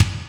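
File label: Gate kick, Boss DR660; drum machine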